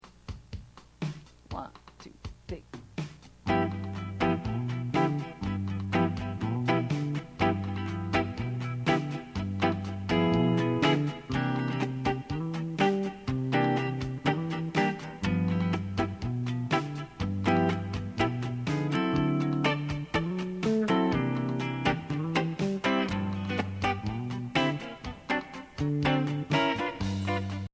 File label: Rhumba blues bass line